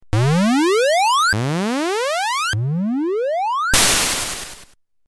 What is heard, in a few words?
pwm sound